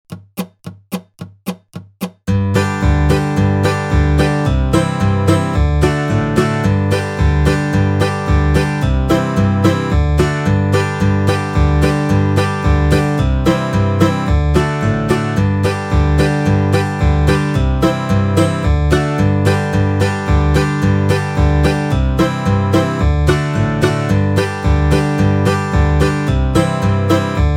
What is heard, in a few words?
110BPM, Old Time Fiddle Tunes